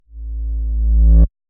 Rise, Whooshes

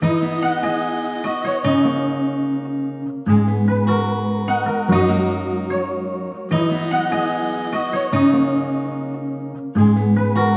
148 BPM G# Min, nostalgic hip hop melodies; Good Vibes

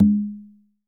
Rebolo - G#; percussion; Cymatics - Infinity Beta Pack 2.0; Zeus Percussion Collection

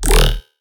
Bass D# Robo; Ghosthack

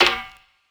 Percussion, Hip Hop, Drum One Shots; Cymatics; Cobra Hip Hop Sample Pack

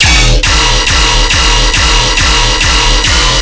Bass Loop D# 140BPM, Dubstep; Grim Reaper's Anthem

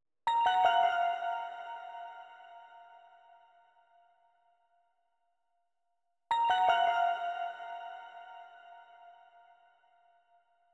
159 BPM D# Min Pluck; Melody; Loop Stems; Cymatics; 2022 Melody Collection Platinum Expansion; Sky Blue

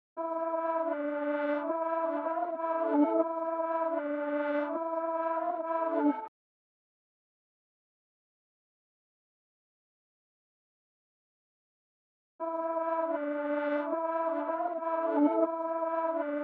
157 BPM A Min Saxo; Melodies; Loop Stems; Melody Mystery Expansion; Frost

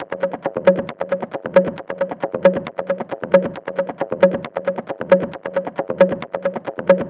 Perc Loop 135, Techno

